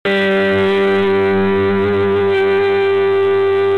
Guitare; bruitage; Instruments muzik

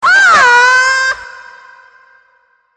Aaaahhhhh femme

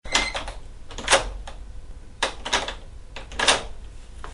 Verrous; bruitage; Outillages

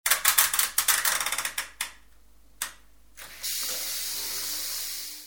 Lave linge selection programme; bruitage; Electro-menager

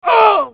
Cri ouille; bruitage

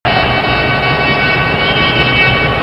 Ambiance stade foot; bruitage

